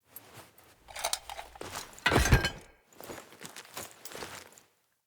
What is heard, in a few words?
Blacksmith Hammer Un Equip; Blacksmithing; Friendly; Tuvalkane